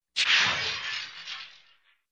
Glass shattering

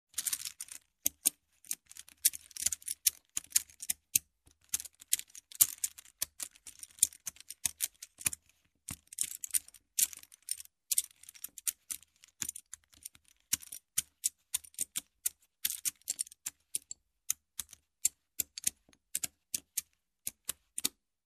Poker Chips Stacking

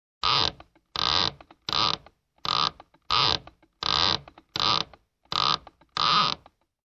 Multiple wooden shelf door creaks

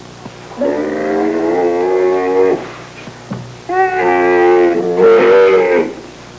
Bleating camel

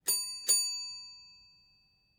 Hotel Bell on Reception twice

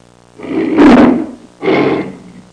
Single lion roar sound

